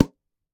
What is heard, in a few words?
Pop; Membrans; Pops Sound Pack 01